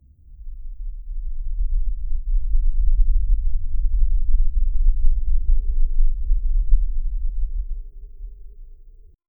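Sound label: deep cosmic rumble mysterious, universe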